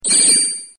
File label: Notifier